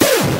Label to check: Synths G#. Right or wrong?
Right